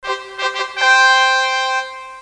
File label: Fanfare; SoftKey